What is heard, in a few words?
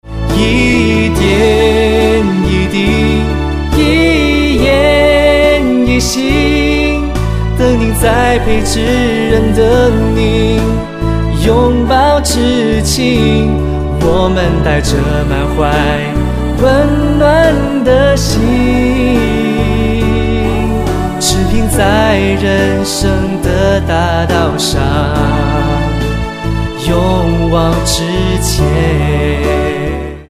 CORPORATE SONG